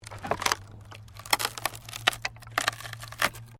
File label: WOOD PLANK TWIST